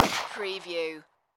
Pistol S W P99 40 Cal Single Shot Exterior At 45ft